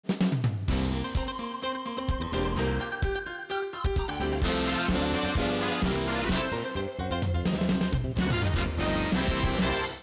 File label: Gospel, Music; Allstate Gospel Superfest